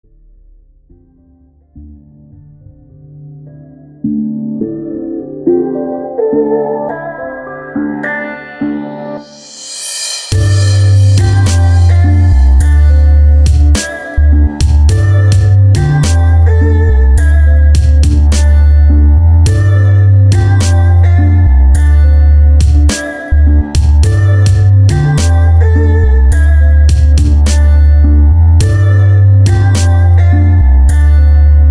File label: Erhu